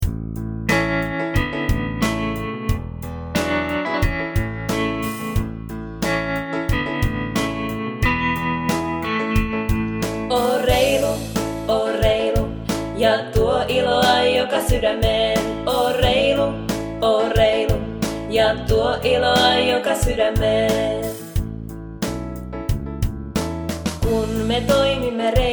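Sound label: Song, Finnish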